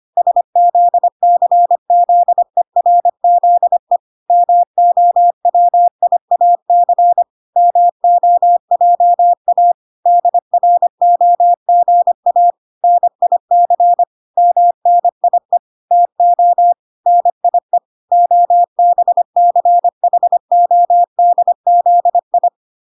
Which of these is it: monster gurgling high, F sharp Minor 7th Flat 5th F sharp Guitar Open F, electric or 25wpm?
25wpm